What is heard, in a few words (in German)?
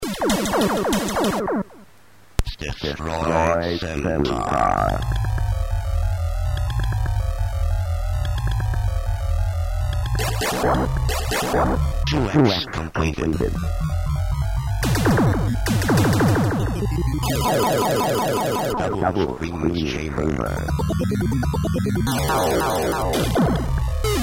Centaur spiel Bally, flipper